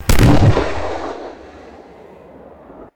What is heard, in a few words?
single maroon flare fired with trailing hiss; boats